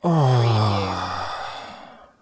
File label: Sighing, Bored Or Relief, Male Relieved Sigh